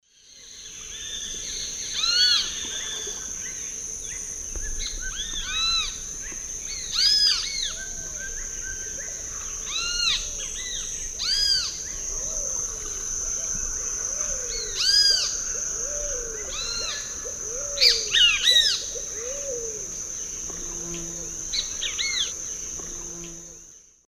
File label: Pitangus sulphuratus, group calls and song; S. America; Eastern Lowlands